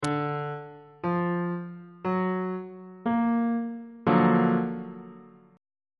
f sharp augmented major 7th 2nd inversion on bass clef